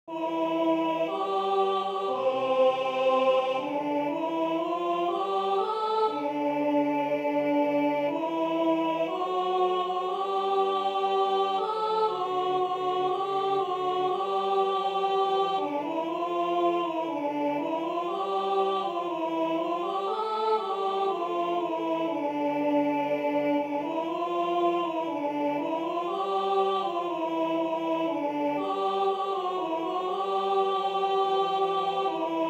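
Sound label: Canada Soprano, chorus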